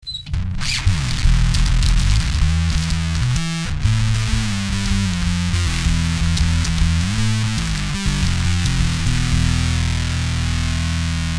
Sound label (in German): voll ohne octa; ak-workshops